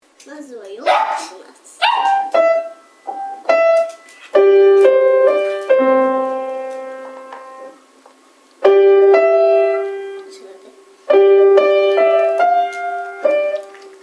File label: piano